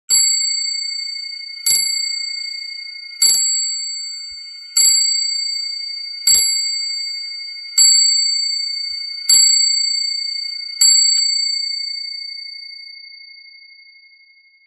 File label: Small Clock Striking; clock chimes rings